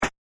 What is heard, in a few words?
step plain